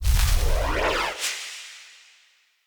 Wyld Blind Cast; Fey; Saboteur

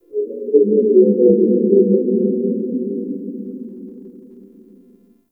Gas City Exterior Low Mid Space Texture; Warframe; Corpus Gas City; Ambience

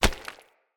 Unarmed Punch Impact Rock

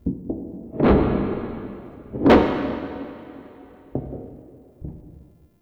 Freaky Taps; Ambience; Warframe; Shadowgrapher

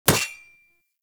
Rising Blades Sword Impact; Warframe; Pax Duviricus

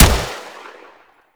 Gorgon Shot, Weapons; Warframe; Void Trader

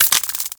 Modular Clip Large Mech, Guns; SU Modular Guns; Clips

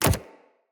companion step default, footsteps; Pets; Zanuka

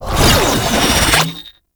Stab Cloak Cast; Enemies; Abilities; Warframe; Tau; Dax Ninja

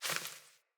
Sparrow One Wing Flaps

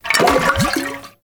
Alchemy Grenade Aim; Entrati

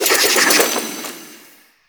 Kunai Reload; Warframe; Weapons; Choir

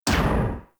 Pulse Laser Mech; Warframe; Railjack; RailJack Weapons

